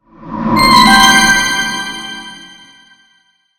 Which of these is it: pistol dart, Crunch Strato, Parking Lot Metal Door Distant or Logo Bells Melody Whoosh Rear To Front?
Logo Bells Melody Whoosh Rear To Front